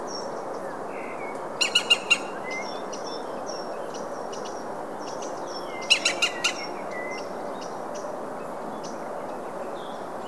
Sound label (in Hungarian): zöld küllő hangja